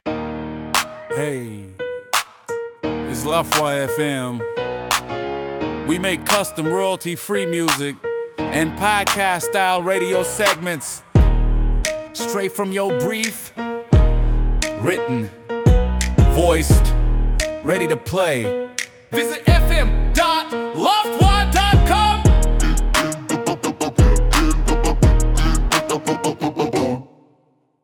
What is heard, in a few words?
HipHop, Hip hop jingle with a danceable groove; 30s cut; Music + voiceover demo